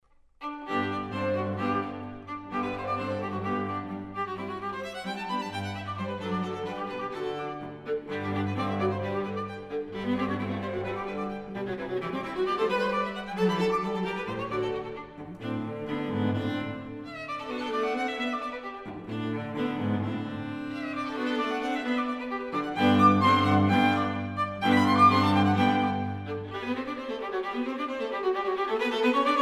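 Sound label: string trio; berger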